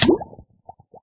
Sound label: drown, player; Minecraft